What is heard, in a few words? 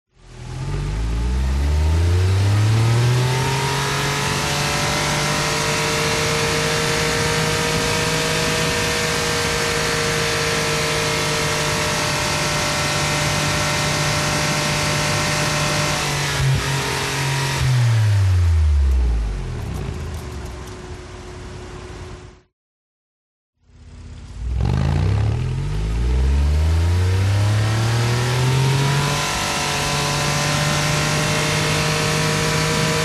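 SPORTS CAR Exterior Engine High RPM Unstable; TRANSPORTATION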